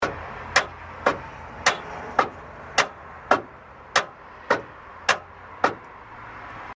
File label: Single Click